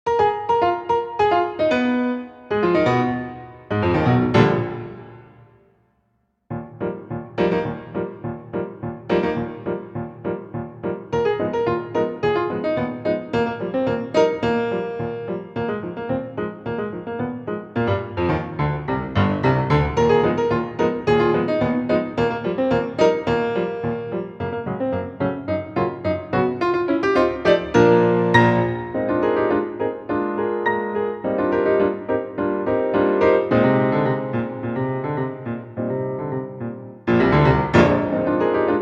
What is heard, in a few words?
piano; debussy; golliwogg